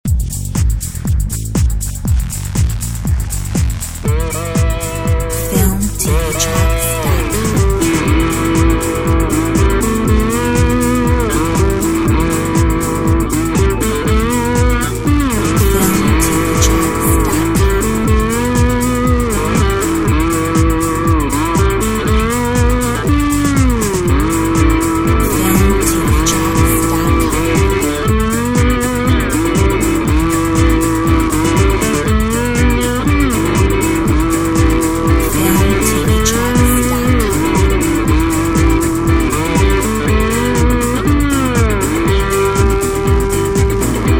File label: Rock